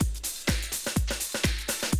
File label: 125, Classic Drum Loops